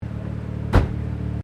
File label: CAR MAZDA RX7 SERIES3 EXTERIOR IDLE DOOR CLOSE; Mazda Rx7 1983; Cars (Sound Effects:Vehicles)